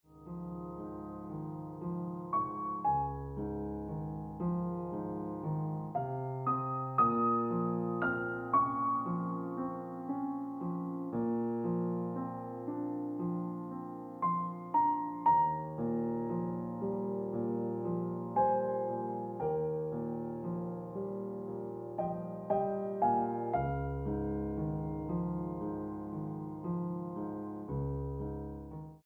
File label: Ringtone Piano Music